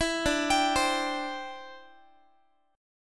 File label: D 7th Suspended 2nd Suspended 4th D, Guitar; D7sus2sus4 arpeggiated